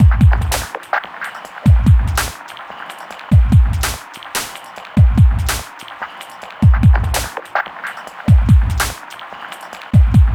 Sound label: Squashy Machine, Drums & Percussion, Electronic; Sonic Foundry (Sony Creative Software) - Electronic Point Blank